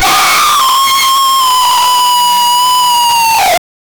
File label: a screaming noise distort; distortion